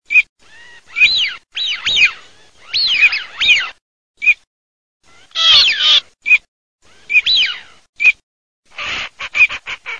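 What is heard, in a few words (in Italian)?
FISCHIONE ALZAVOLA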